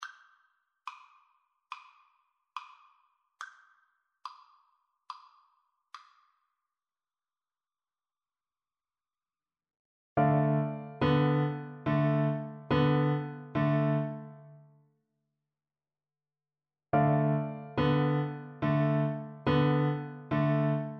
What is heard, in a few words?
boogie, Flute; elise